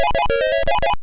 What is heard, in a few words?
Alarm; WonderBoy